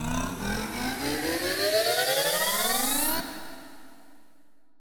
Synth White Noise Riser

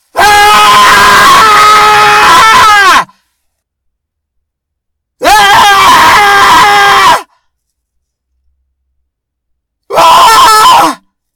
Screams

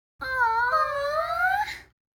Kids Reaction "Awww"

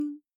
Notification Sound

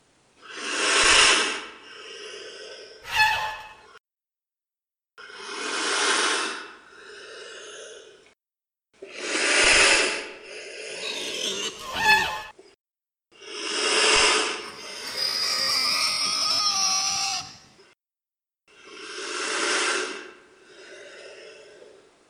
Sarlacc Monster

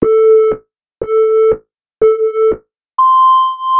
Start Beeps

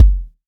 Dnb kick

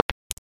Click

